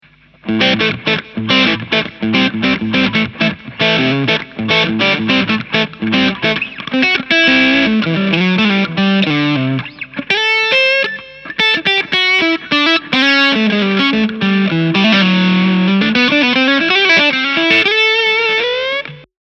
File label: gain boost